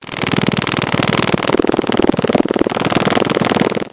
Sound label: bug sound; How Many Bugs in a Box